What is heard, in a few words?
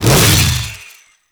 Saw Melee Swing, Weapons Melee; Warframe; Grn Ghoul Saw Player